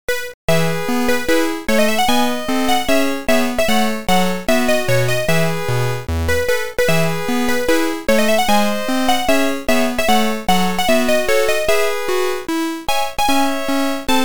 Name Entry, Congratulant Message

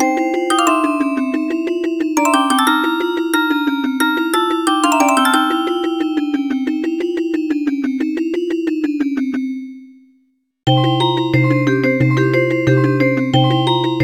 Musicbox - Chip

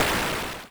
Panel Weapon Hit; Among Us

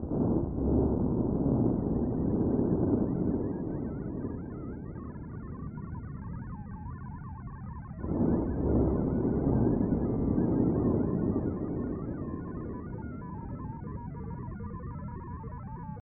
Danger Tonal; Insanely Twisted Shadow Planet

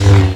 PLASMA SWORD; blockade classic

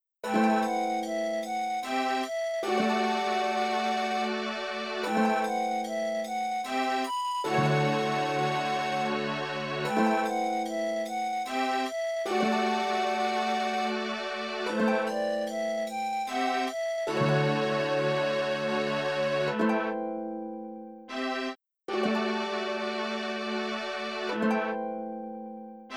Flute; Tsuru; kuro no juusan gamerip

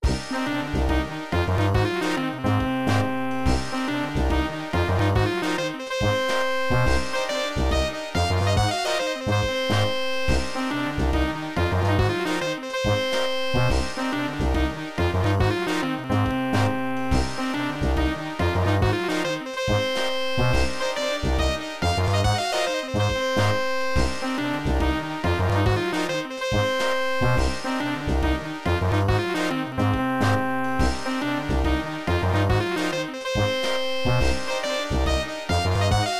Enemy Ship; boogie wings arcade gamerip 1992

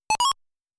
Normal Flag Get

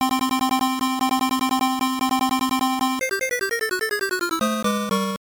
Start Game; time soldiers; master system

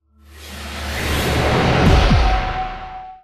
Camera Transition; Sam & Max Save the World

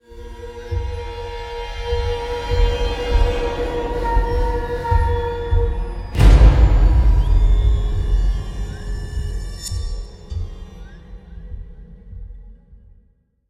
Stinger Medium With Hit; Sniper Ghost Warrior 3 2017

